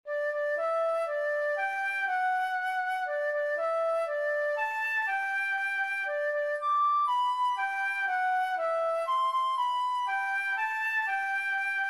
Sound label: Happy Birthday, flute; flute notes